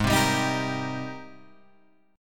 G sharp Major 9th G sharp, Guitar Open G

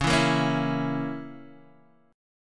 C sharp Minor 6th C sharp, Guitar Open D

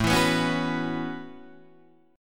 A 6th Add 9th A down Guitar Open D

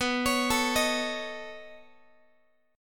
B 9th B down Guitar; B9 arpeggiated; JGuitar

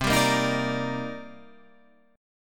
Eb Major 7th C; Ebmaj7/C chord; JGuitar

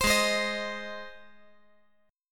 G sharp Major G sharp, chord

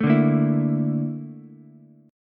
A sharp Major Sharp 11th, Ukulele, C6; strummed; JGuitar

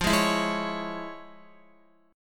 F Minor Major 7th Sharp 5th, F, Guitar; strummed; chordshape; x,8,8,9,8,9

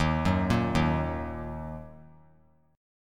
D sharp Minor, chord; arpeggiated; JGuitar

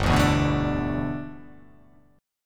G 6th Add 9th G, chord

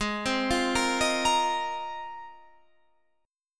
Ab 6th Add 9th Ab; Ab6add9 arpeggiated

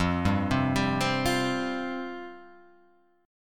F Suspended 2nd F

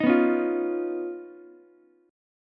D sharp 7th Suspended 2nd Sharp 5th Ukulele; strummed; JGuitar

